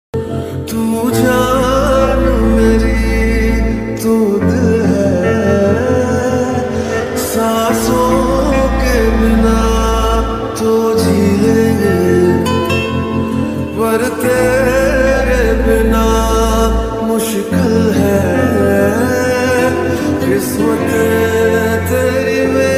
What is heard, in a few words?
lofi ringtone